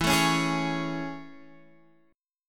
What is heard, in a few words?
A Major 7th E